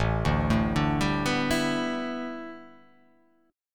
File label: A 9th Suspended 4th, A9sus4 chord; arpeggiated; JGuitar